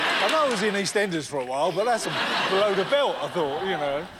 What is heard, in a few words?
cockney belt